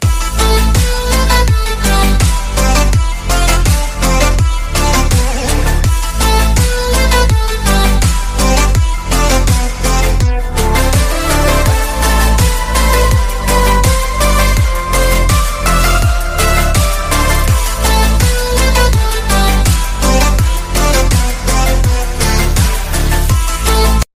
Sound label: english ringtone; english ringtones